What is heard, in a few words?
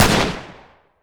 g3 fire; g3a3; garrysmod